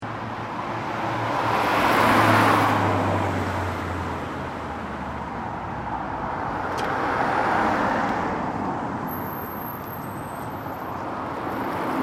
Traffic Cars Pass By Calm Enviroment, Small town light traffic ambience; Close recording of cars passing by. Fairly calm, airy enviroment.; Traffic And Car Sound Effects